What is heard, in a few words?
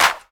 good clap sound c sharp key; single hits one shots